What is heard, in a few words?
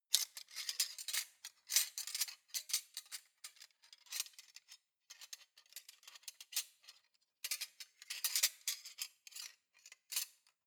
cutlery large multiple rattle long